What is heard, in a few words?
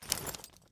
ru-556 foley; weapons